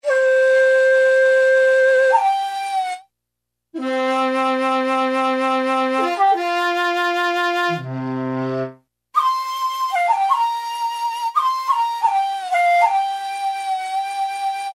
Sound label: Shakuhachi, Roland U-110; Factory Patch